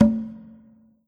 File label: Yamaha TG100 Low Conga